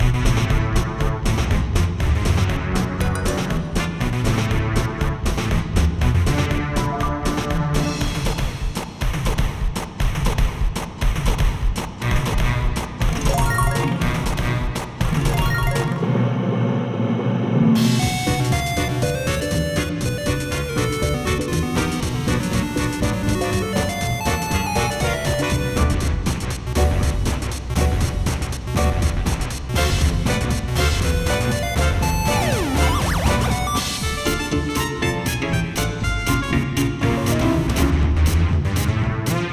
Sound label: Space Minigame Pinball loop